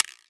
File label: EQ Perc, Percussion Mix